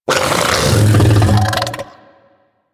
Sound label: Vocal Burp Attack; Warframe; Hunting Critters; Undazoa